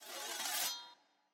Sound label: ambience room polygon cut training